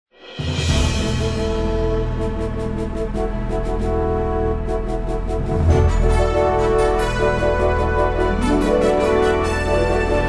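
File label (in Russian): фанфары начало представления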